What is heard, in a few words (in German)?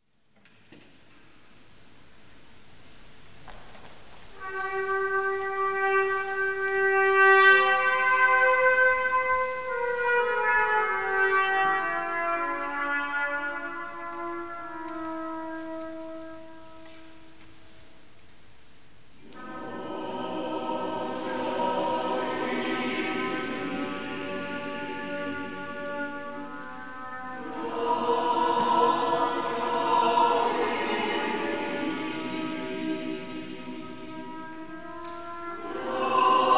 Andachtsjodler; otto wagner kirche; chortontabor